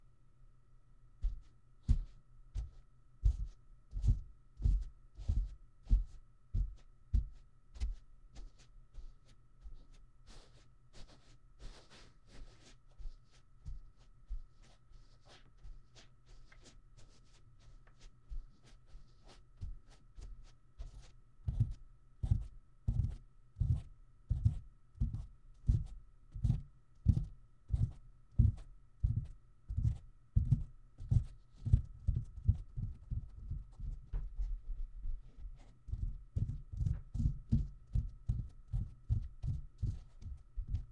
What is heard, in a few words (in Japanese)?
タッピング; おまけ タオルマッサージ